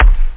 pop; OctaMED SoundStudio V1; drums bass

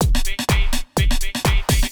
DRUMLOOP HOUSE 125; eJay

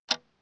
clk tick; That - For Windows 95; HOOP

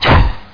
spike, weapons; Hexen 2

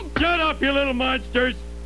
MONSTERS

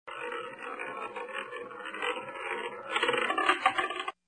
moving Ball; Jogos de Azar

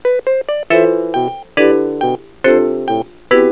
music

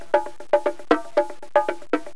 Bongos Loop

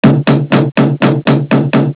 KNOCK; Spanish Net

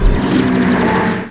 air; deadlock

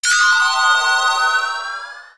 skill train; Earth and Beyond; EA Games

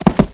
land

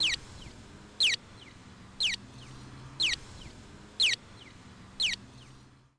SIGNAL, commercial

